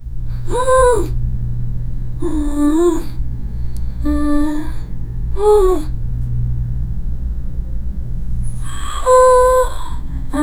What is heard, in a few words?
sound of a freezing woman, cold